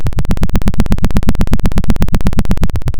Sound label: pop, Sound of a helicopter